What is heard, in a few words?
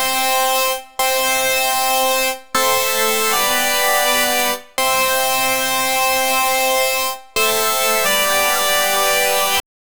k pop lead